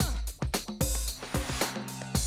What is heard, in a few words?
Classic DrumLoops, 110